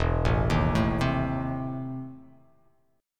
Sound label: E Major 7th Suspended 4th Sharp 5th E down; arpeggiated; JGuitar